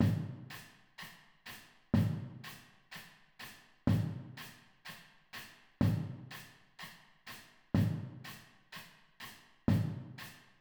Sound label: full drumline